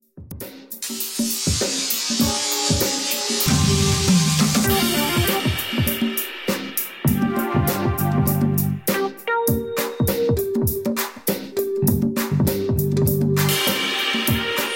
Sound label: canons dance hip hop